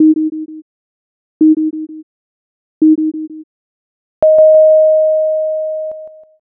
jiho

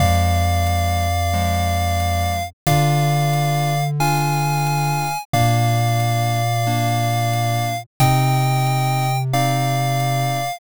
bgm mellow

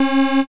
wrong buzzer

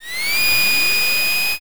kettle whistle

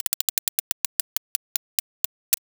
roulette

